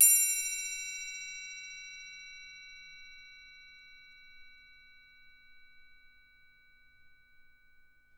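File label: SM TRI right, percussion; Miroslav Vitous - Percussion & Harp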